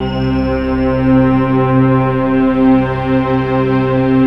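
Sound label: C 3, BELL STRINGS; Best Service; Advanced Media Trax Modern Composer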